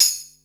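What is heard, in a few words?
RX-5 tambourine; 300 Drum Machines; Yamaha Motif ES-Series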